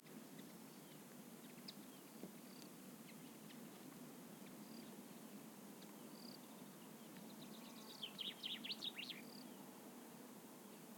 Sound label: vineyard evening ambience, hill, very quiet, birds, crickets, Crimea in summer; ortf offset 5 m; front - ORTF; Quad; Alexander Kopeikin